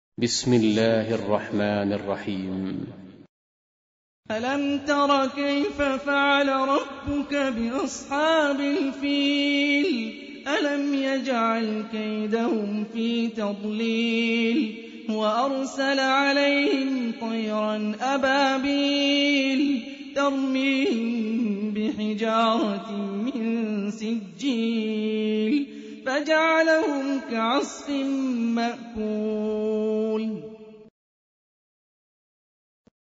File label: Quran; Hani Refaey